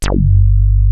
WEST HARD G1, ANALOG BASS; Music Store - Claudius Diemeter Schwerlos

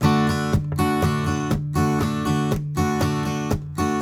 Blues Guitar, RIFF; ILIO; Fretworks

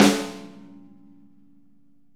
snare; Sonic Reality; Interactive Drum Kits

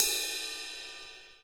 crash tip, drum kits small

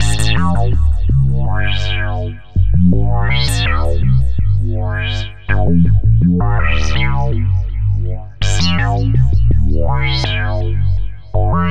Synth Bass

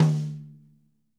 mid tom; drum

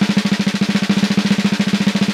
Vintage Snare, Snare Drum; Q Up Arts; Johnny.C's Vintage Snare Drum

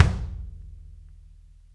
kick, Interactive Drum Kits; Sonic Reality

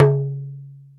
Talking Drum Tone; Multisamples; Digital Sound Factory Planet Earth

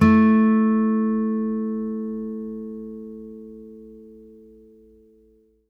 5ths A3, blues guitar; ILIO; Fretworks

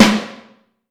Snare Drum; Q Up Arts; Johnny.C's Vintage Snare Drum; 5X14

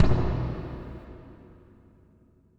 FX BD, Drums Acoustic; FX KIT; USB Soundscan vol.10; AKAI; 90s sample CD

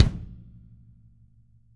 kick, Drum Kits; Sonic Reality

